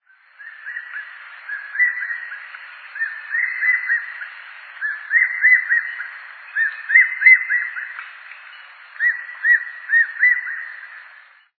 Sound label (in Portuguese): gaviao-de-penacho